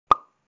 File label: cork Pop